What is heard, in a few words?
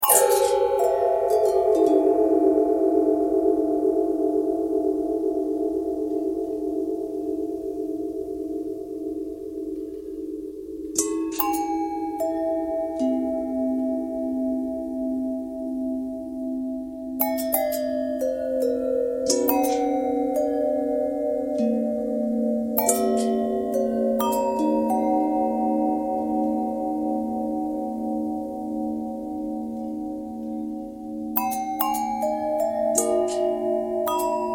longbell bendtwist